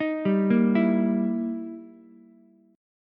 E Minor Major 7th Flat 5th, E, down, Ukulele; arpeggiated; JGuitar

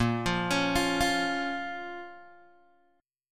Bb Minor Bb down Guitar Open G

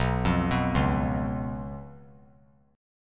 B Suspended 2nd Flat 5th B; arpeggiated; JGuitar

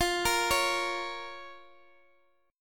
F Suspended 4th Sharp 5th, down, Guitar, Standard; arpeggiated; JGuitar

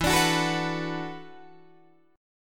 E 7th Suspended 2nd Suspended 4th, Guitar, DADGAD

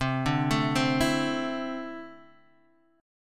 D 7th Suspended 2nd, chord

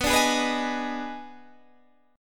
Eb Major 7th Suspended 4th Sharp 5th B

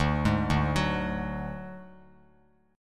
D Major Flat 5th D Bass Standard, chord; arpeggiated; JGuitar

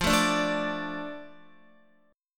F 6th F down Guitar; F6 strummed

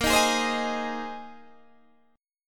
A sharp 9th Suspended 4th A sharp, Guitar, Open D

